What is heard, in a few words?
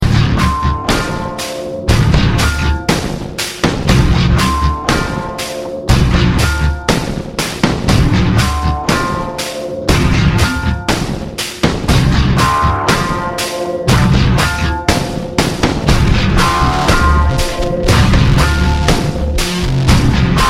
Fancy Ringtone